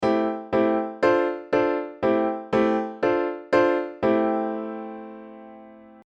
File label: Aeolian prog; Modal Harmony: An In-Depth How-To Guide